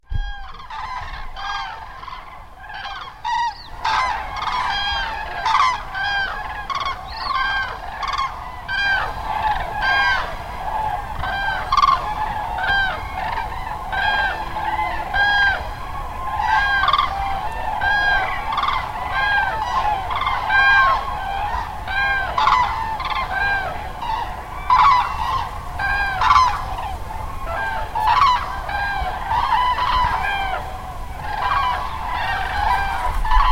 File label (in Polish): Zlotowisko zurawi